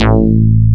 DIGITAL BASS, BassGuitar; AKAI S6000 CD-ROM - Volume 1

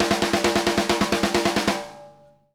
SNARE B; Sampleheads - New York City Drumworks VOL-1

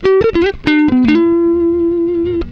SOLO LICK, Gtr; PlatinumPhattCD2; E-MU Producer Series Vol. 8 – Platinum Phatt

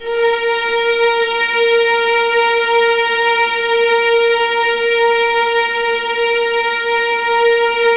A#2, Ultimate String Collection, VLAS EXP; LEG

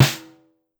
Snare, Pitched Down; Clavia DDrum-AT

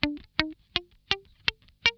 scale A4, guitar; Funk Guitar; Zero G - Funk Guitar

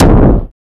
DUTCH KICK; Masterbits; Soniq Elements

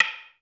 Korg 01W Dance Kit; 300 Drum Machines

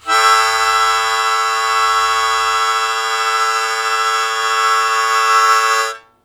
Blues Chord Attack A1, Harmonica; Zero G - Harmonica

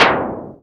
NOIS; E-MU Formula 4000 Series Vol. 2; Dance Hits

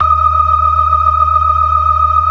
BLOOD OCT R, ORGAN

